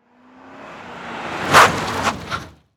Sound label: Auto Police Car Skid Stop; Transportation